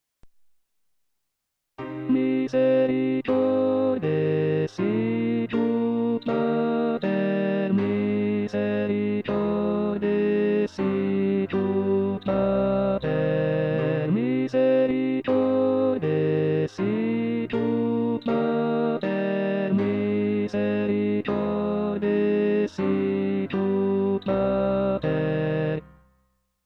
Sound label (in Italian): misericordes inizio tenori; anno liturgico